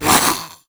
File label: mimic cry; Crypt of the NecroDancer